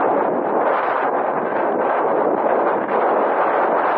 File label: wind strong